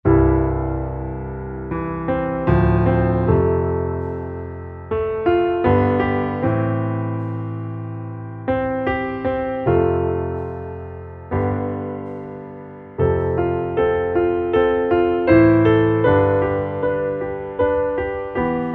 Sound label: Underscore PIANO; Worship Flow